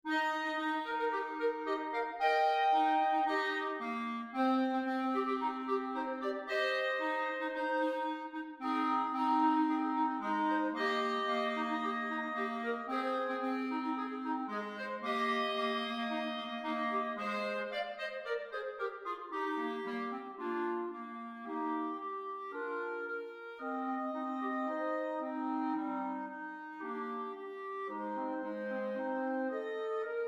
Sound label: Concord Fanfare